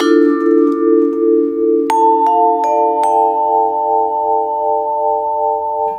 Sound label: Lounge Vibes, loops